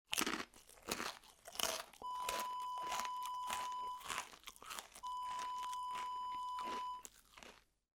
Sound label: crunching